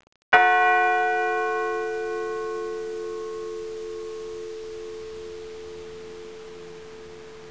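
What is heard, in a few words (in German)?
clausthal kleine a mono, glocken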